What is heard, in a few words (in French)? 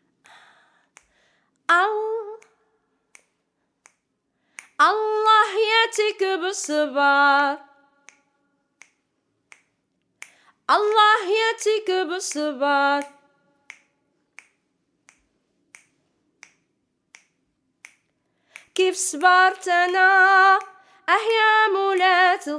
CHANT GROUPE REPONSES VOIX